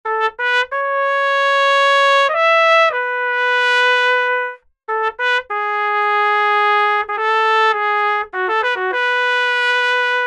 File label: vhorns